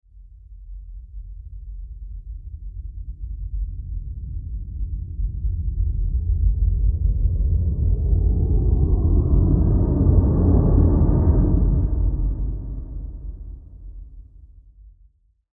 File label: ultra low flange rise